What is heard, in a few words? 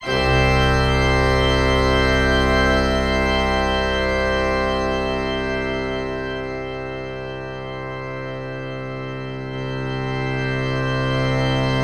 Chord Version